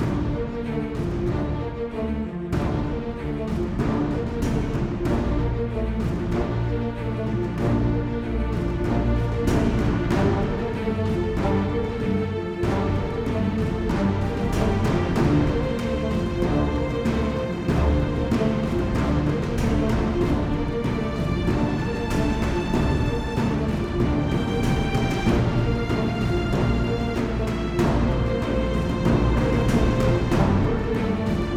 Suspense Music Loop; The Emerald Maiden

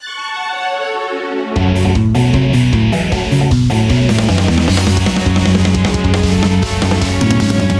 Battle Intro; Voidspire Tactics

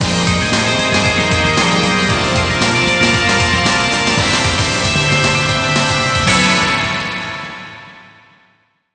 Music Game General event

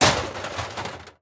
plastic break; teardown; ps5 windows xbox series xs

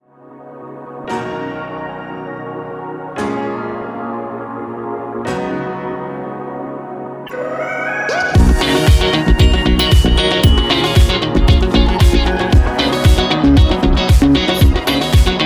Rhythm Theme Chic Alt (115 BPM); Yum Yum Cookstar

